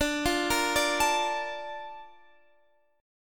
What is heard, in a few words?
A sharp Major 7th